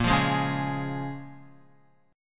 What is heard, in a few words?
Bb Minor 7th, Guitar, Bbm7 chord; Bbm7 strummed